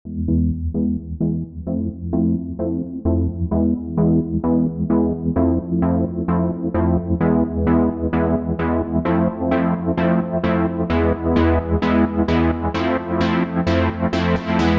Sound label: Keys; PatchFactory